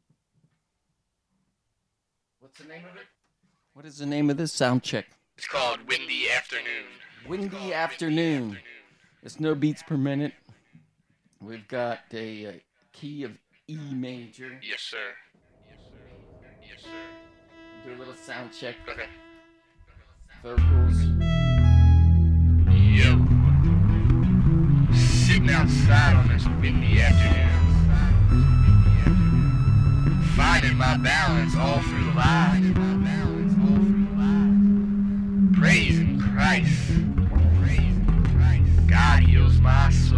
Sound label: Soundcheck